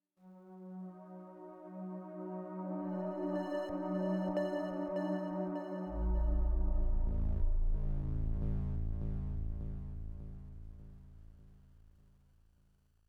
Roaming Melody

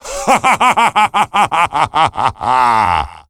Laughter

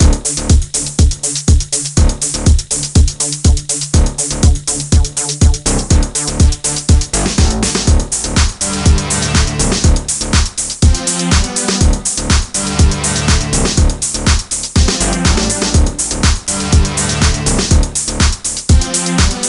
Music Catwalk; Littlest Pet Shop 3 Blue Team Pink Team Purple Team; NDS

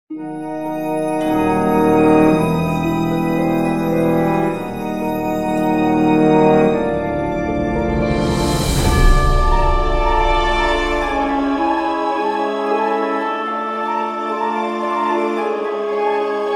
Jingle Opener Menu

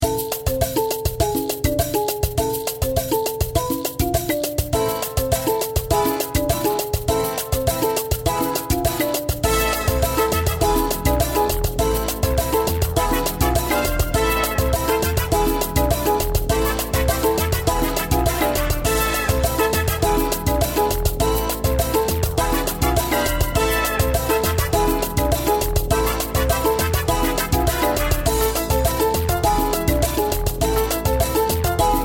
bgm zone field; goken

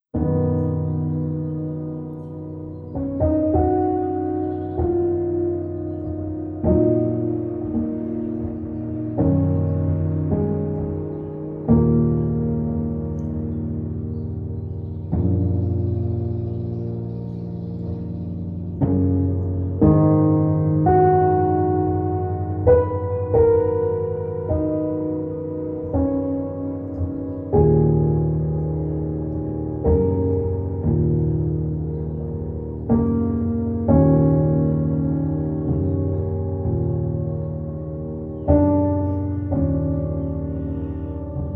Soft Piano Solo

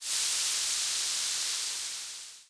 Barbeque

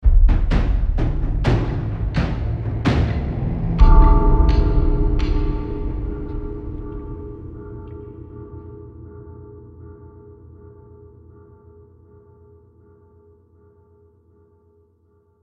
Tension Combat End

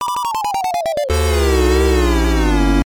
SFX Time Up

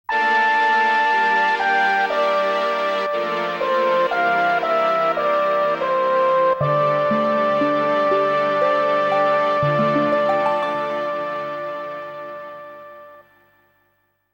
Defeat; front mission original sound version

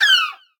Beach Seagull; Zombie Catchers

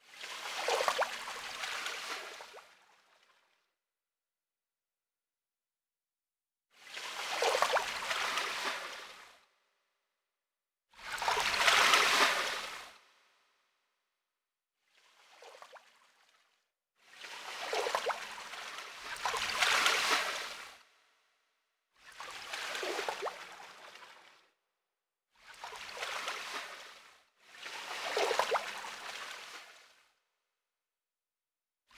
Ambience River; AR Games; AR Shot

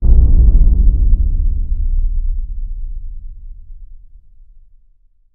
Subwoof; chivalry medieval warfare 2012 pc

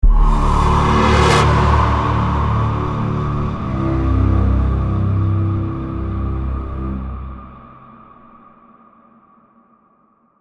Stinger, soundtrack

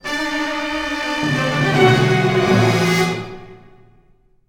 Sting; Courtyard

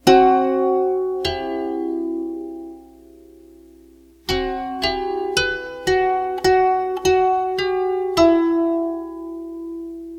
Koto; Worms Forts: Under Siege

